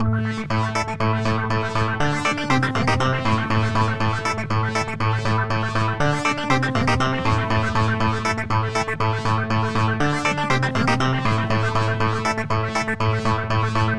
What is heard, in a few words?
Fuzz Clav Groove